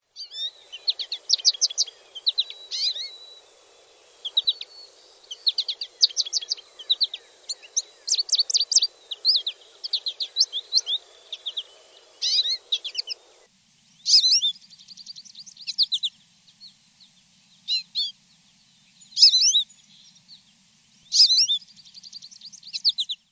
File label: American Goldfinch